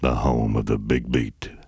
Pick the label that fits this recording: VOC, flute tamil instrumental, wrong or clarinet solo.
VOC